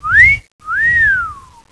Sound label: catcall; Reflux